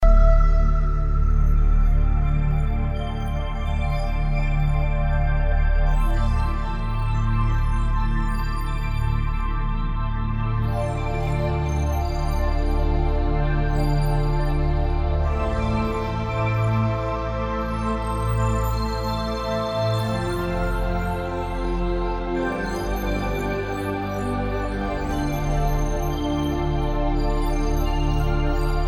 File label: Forest of Spells Looping; altariaonline